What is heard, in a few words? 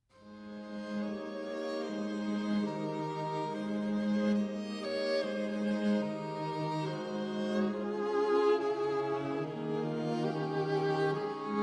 String Quartet in C Minor, Andante